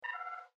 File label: resume log, computer